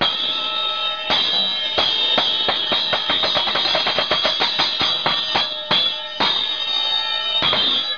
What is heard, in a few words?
cymbals